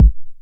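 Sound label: 909 BD tape, kick drum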